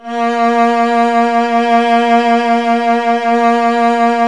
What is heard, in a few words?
Virtuoso Strings